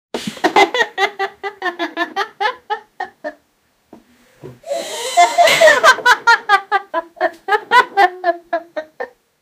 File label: laughter of two boys and, sofa